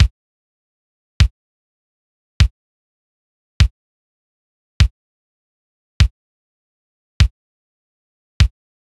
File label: Gated Drum Loop 100 BPM Kick; Analog; Cymatics - ANALOG EVOLUTION Beta